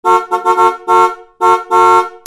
CARS

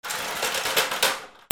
GARAGE

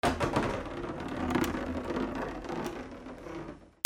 FRICTION

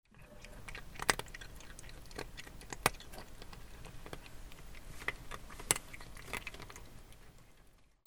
ANIMALS

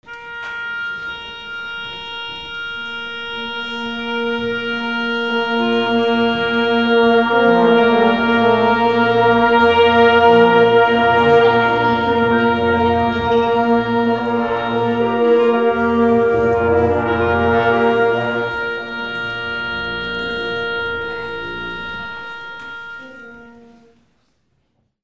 MUSICAL

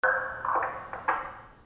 PHONES

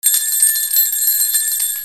BELLS

